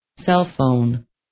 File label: cell phone